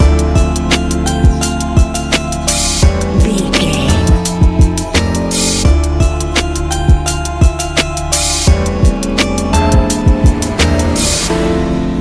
lofi, A relaxed and chilling piece of music

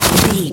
footstep snow land

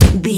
punch low deep impact

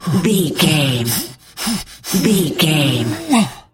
voice male effort breath lift

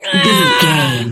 voice female death

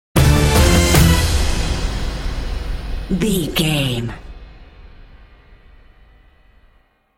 orchestral tension and suspense sting

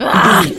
voice female battle shout short

